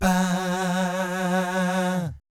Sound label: VIB FX, Jazz FX